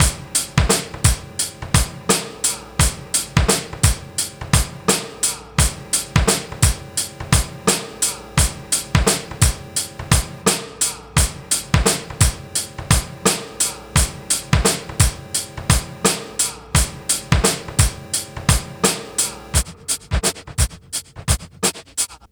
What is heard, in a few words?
86 bpm; TRIBE VIBE; 90 s Sample CDs; Planet of the Breaks